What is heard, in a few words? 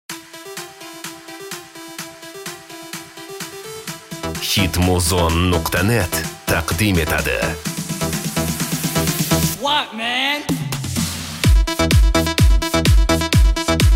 disco remix